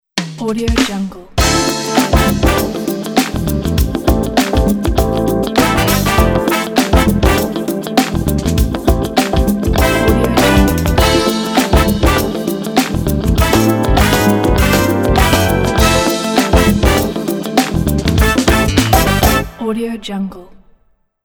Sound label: tv show radio intro pop funk